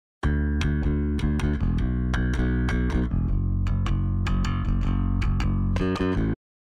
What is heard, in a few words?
Bass